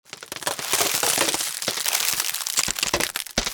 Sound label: branch break, tree